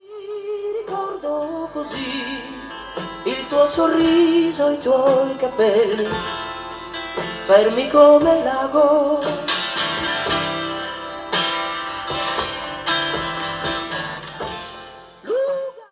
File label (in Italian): provino voce e chitarra